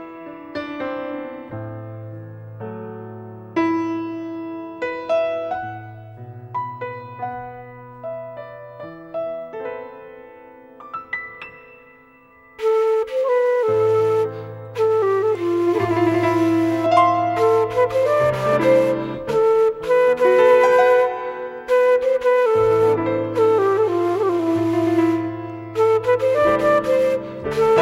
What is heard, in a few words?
Plinn Bal